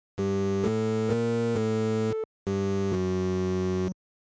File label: Arcade game 16 bit or 8 bit mission failed game over; Game Over Sound Effects; the sound of losing a life